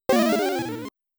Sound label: Fall Sound; Power Flying Part; Motos